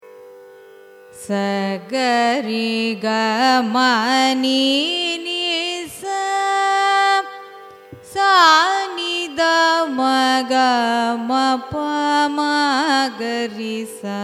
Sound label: raga reethigowlai arohanam avarohanam, carnatic music